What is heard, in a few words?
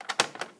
play on, Tape recorder +2A; Mass storage; zxsp